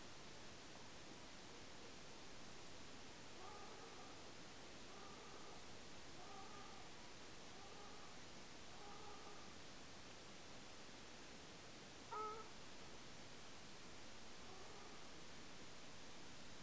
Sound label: Ruddy Shelduck series, nocturnal flight call; LINGSHAN; Beijing nocturnal flight call library